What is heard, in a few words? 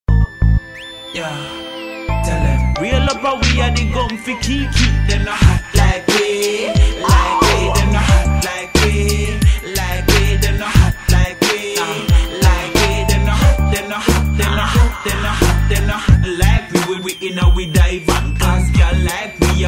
beat